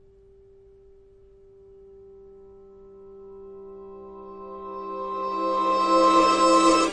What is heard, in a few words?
long reverse c chord, Guitars